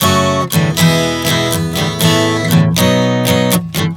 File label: Prog 120 F#m E A B, Acoustic Guitar, Chord Progressions 120 bpm